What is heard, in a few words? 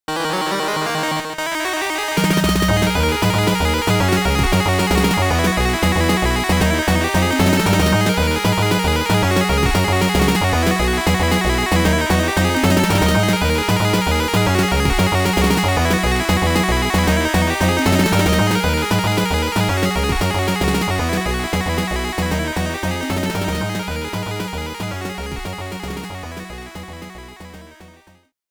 Boss Battle; Mega Man The Wily Wars; The 16bit Audiophile Project; Genesis